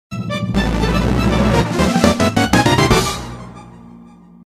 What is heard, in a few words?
Online Race Start Fanfare; mario kart tour original soundtrack